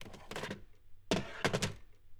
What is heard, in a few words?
Phone Pick Up Hang Up